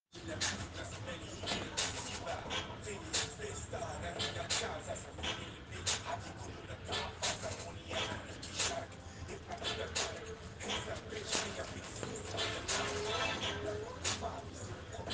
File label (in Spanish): ruidos ceti